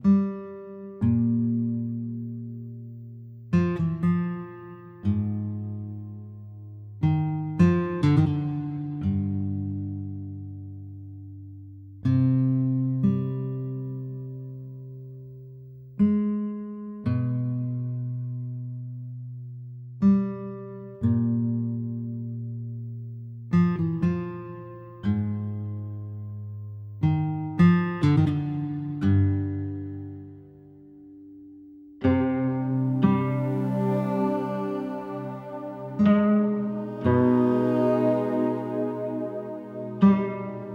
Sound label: spookiertown lowkey loop 120bpm Cmin